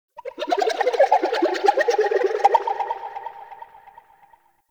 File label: Ocarina; Warframe; Ambience; Nokko Colony